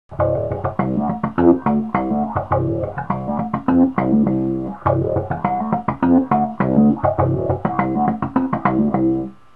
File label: Funky Bass; Home Studio